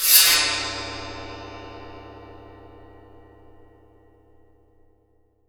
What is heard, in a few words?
wind gong; Voice Crystal - Steve Reid Definitive Percussion